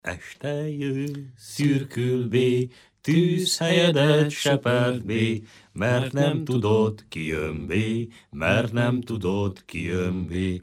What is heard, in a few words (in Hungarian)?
nepdal